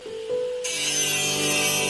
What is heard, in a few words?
electrical razor